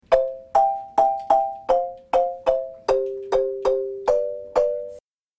soprano, marimba; second soprano; chords 2; babamudiki

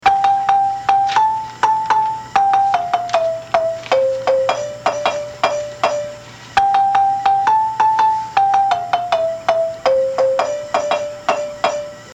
lead high, marimba; manhanga

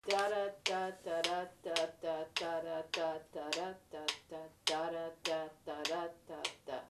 lead basic rhythm, marimba; kare mugomba

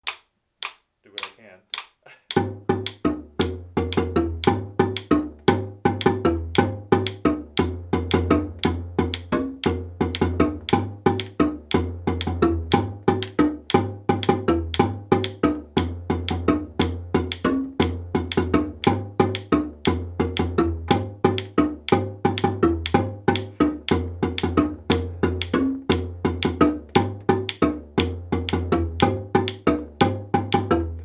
bass funky, marimba; kare mugomba

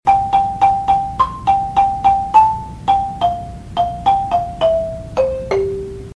lead main var, marimba

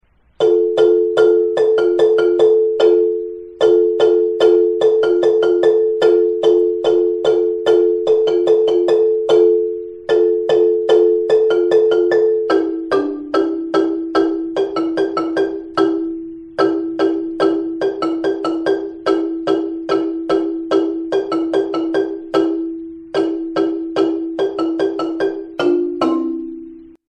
verse, marimba

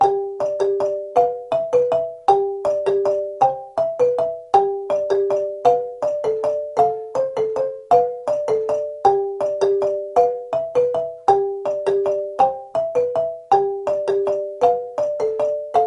lead middle muchongoyo, marimba; kare mugomba

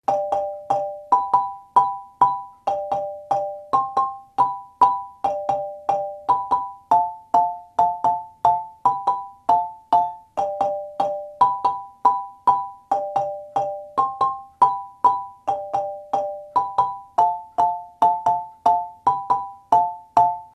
lead high chords, marimba; kare mugomba

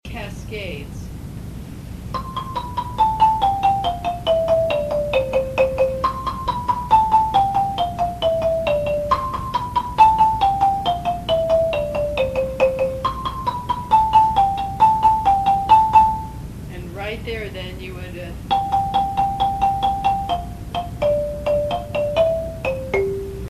lead chordal cascades, marimba

